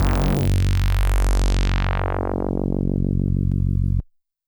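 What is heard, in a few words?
DX100 F#2; rocket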